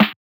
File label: Snare; flugel